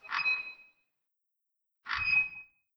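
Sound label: underground ambient; blackforest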